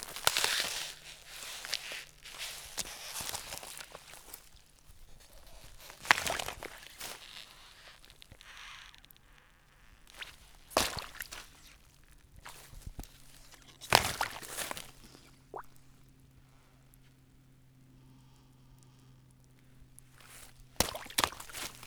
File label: Walking on thin ice; SFX; IRL Recorded